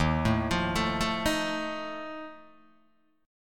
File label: Eb Suspended 2nd Flat 5th Eb down Guitar DADGAD, chord; Ebsus2b5 arpeggiated; JGuitar